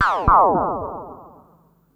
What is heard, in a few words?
Perc Synth Moog Delay